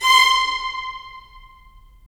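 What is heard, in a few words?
strings violins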